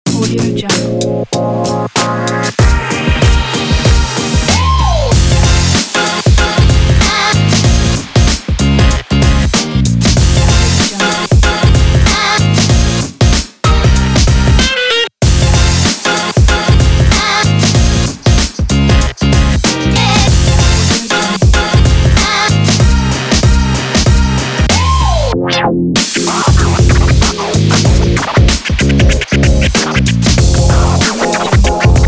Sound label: hiphop summer upbeat